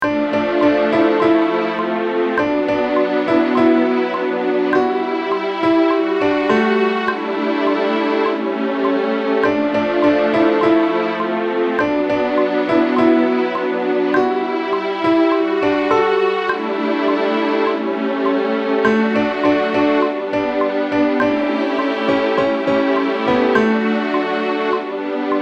All Strings

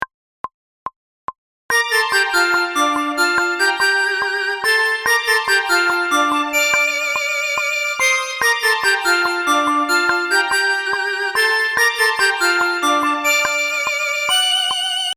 Lead sub

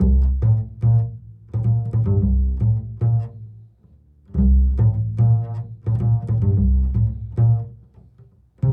B bass

